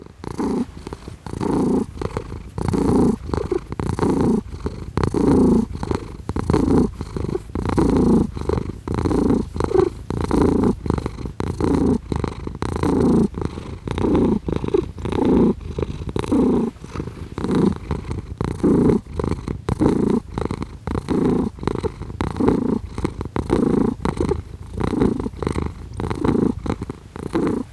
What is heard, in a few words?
Cat Purring; افکت صدا حیوانات; Animals